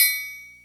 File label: Korg N5 Rock Kit C#3